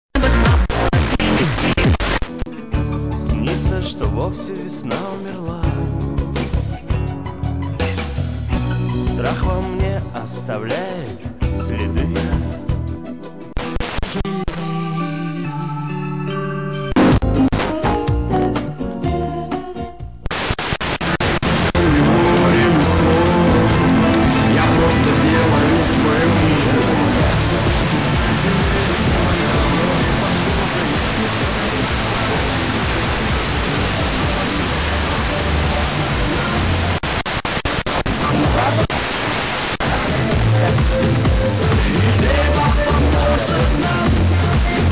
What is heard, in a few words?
85 110 MHz